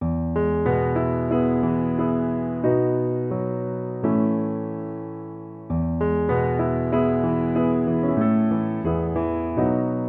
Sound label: solo piano